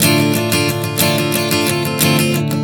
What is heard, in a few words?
Strum 090 Dm, One Chord Strumming 090 bpm